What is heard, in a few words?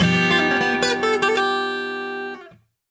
Jingle Retire; clubhouse games 51 worldwide classics 2020 switch gamerip